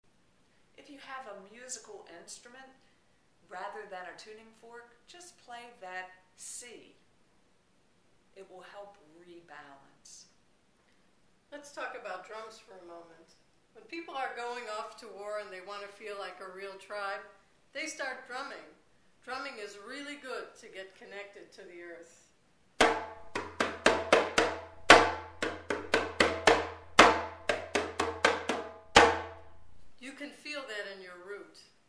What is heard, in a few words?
Root Musical Instrument, Chakra Healing